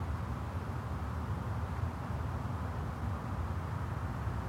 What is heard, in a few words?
plaza